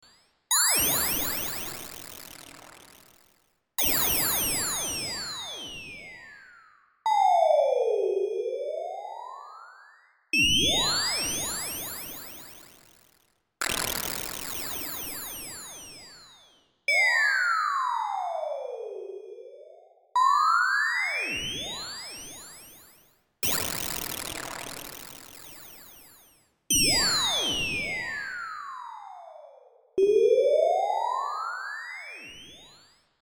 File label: ems FM FX